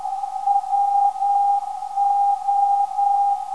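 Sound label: WO 303kHz, non-directional beacon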